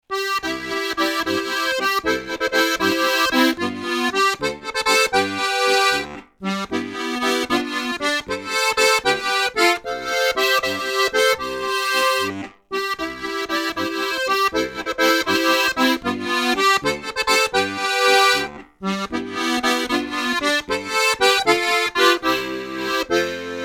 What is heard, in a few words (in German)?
Tremolo flach